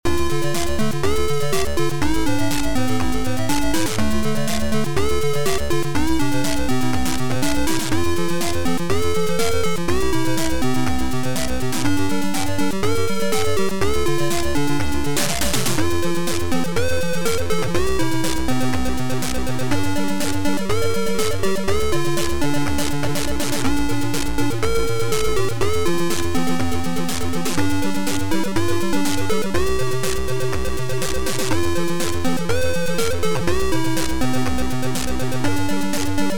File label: METAL